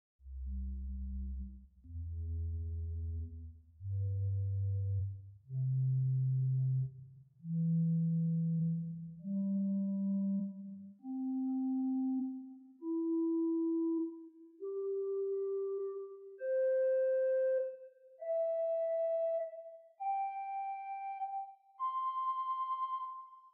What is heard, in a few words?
Lieblich, organ; Prudhoe Methodist